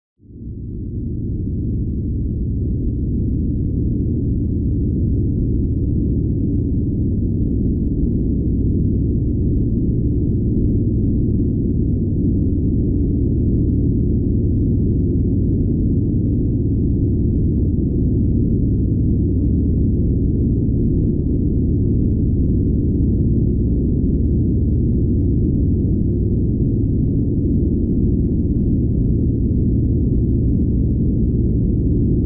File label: White Noise; Absorption